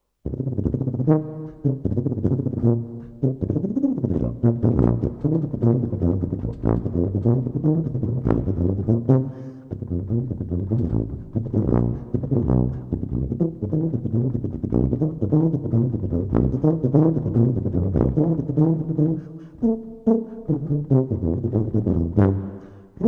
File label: Street Blues, HEAVY TUBA